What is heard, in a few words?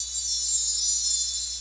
TREE, COOL PERCS; Crypton - DrumBase5000